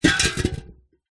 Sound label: industrial gas cannister metal down on concrete